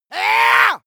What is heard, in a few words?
male shout fight